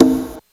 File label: Conga Dirt; One Shots; Drums; Ableton Live 12 Lite; Core Library